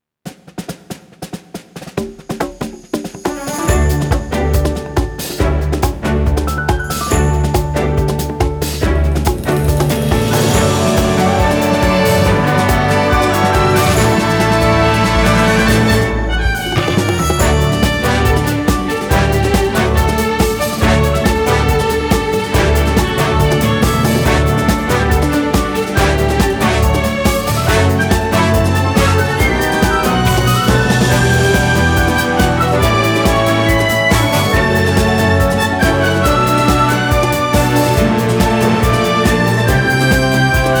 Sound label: Bouncing Beats 蹦跳的节拍; Wuthering Waves; Melodic Verses